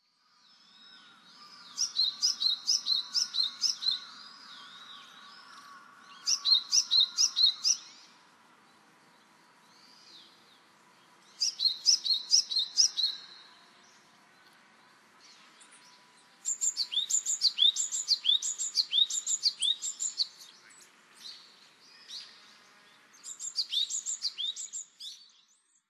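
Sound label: Japanese Tit; kunitachi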